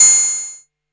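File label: Axxe hi-hat open, ARP Axxe Drum Machines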